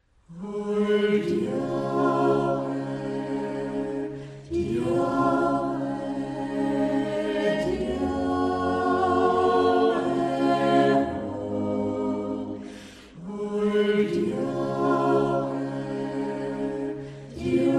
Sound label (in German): Adventjodler; Lavantklang